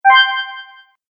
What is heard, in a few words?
Fantasy Magic Accent; Magic Sound Effects